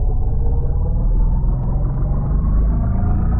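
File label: engine accel; decimator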